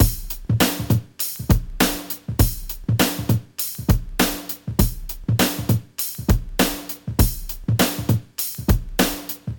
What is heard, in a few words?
100.1 bpm g sharp ragga drumloop